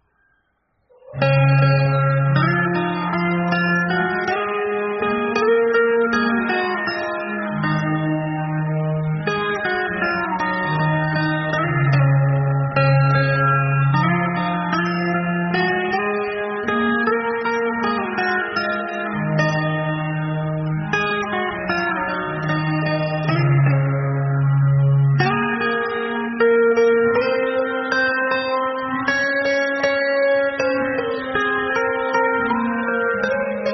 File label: Instrumental